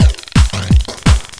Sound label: LOOP, Eurotech; Sounds Good